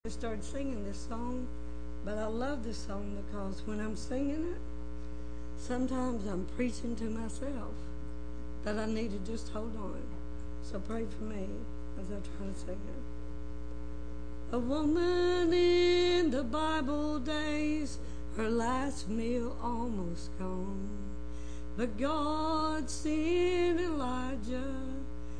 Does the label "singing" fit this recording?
Yes